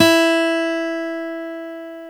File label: Guitar E4, Dual Guitar; Club-50 - Foundations Roland